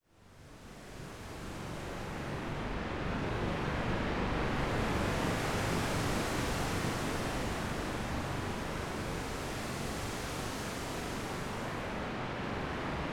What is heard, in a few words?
Forest Leaves and Wind